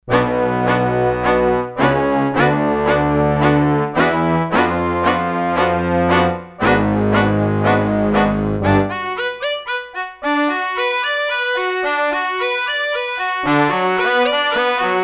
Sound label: X-mod Strings, Roland D-10; Factory Patches